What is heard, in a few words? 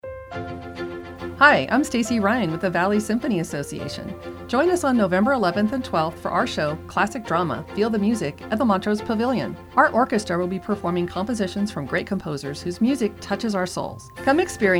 Classic Drama